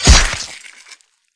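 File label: crowbar hit body; valve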